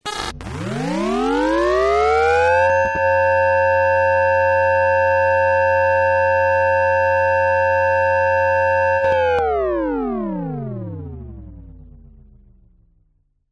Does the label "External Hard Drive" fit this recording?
Yes